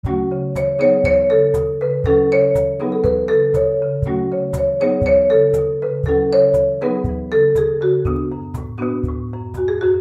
Marimba

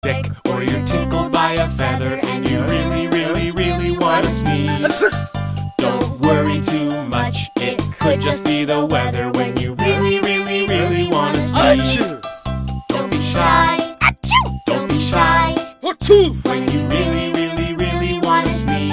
The Sneezing

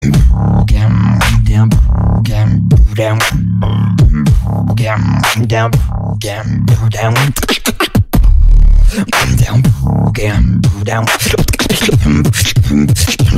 Beatbox

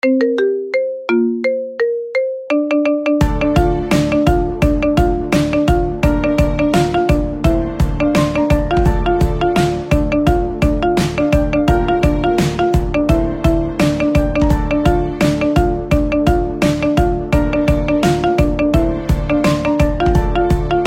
Marimba Remix

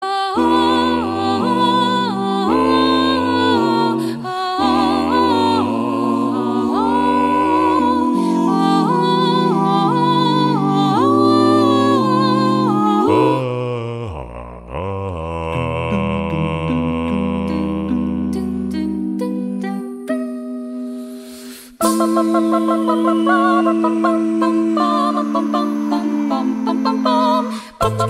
Acapella; Harry Potter

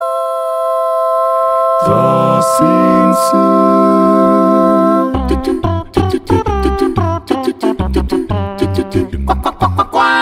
Acapella; The Simpsons

